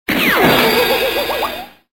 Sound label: Zoom Gong